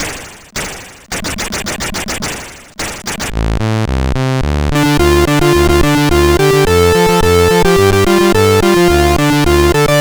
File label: Music, CSG8580R5